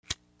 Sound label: FIRE